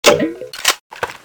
water cannon clip in

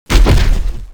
zombi trapsetup; cso

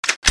bolt pull, weapons

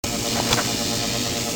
stunrifle draw; weapons

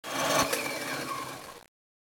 clipin, weapons; airburster

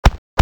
zombi stamper clap; cso

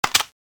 mp40 clip out

